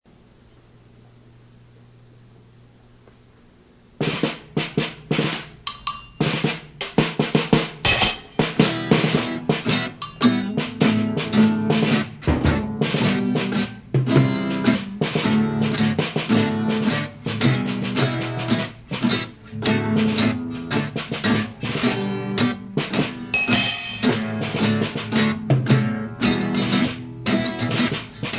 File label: drummy jam